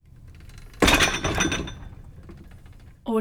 weightlifting machine; prosoundfx

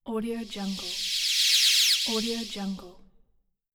shimmer swell

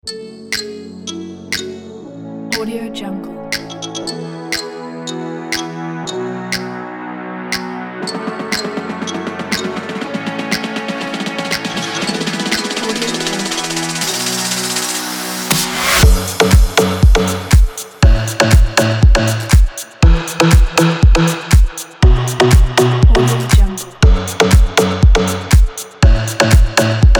fashion dance; sevennotes

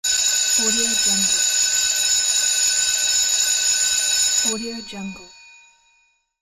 school bell; n-audio

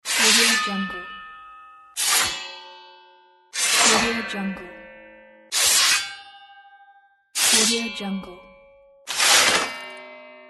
sword sheath pull put

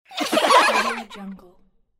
group kids giggle

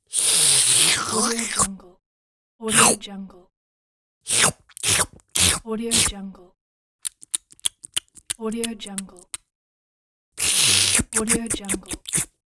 cartoon comical eating sounds

